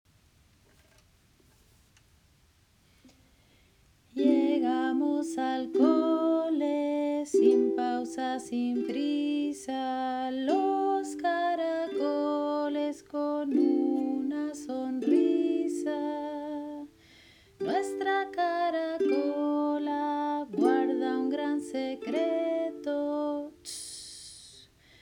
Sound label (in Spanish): Caracoles ukelele